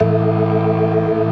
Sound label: STOPS, Synth and Organ